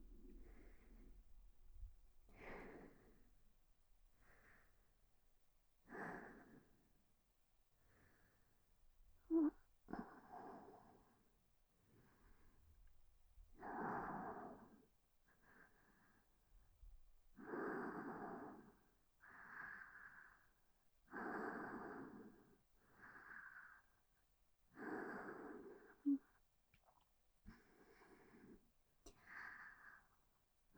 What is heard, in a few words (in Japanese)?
寝息のみ